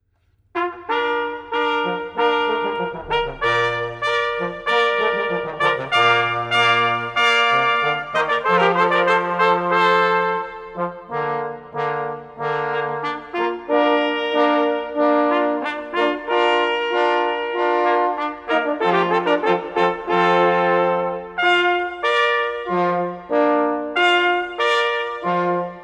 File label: Fanfare, brass; Handel; Water Music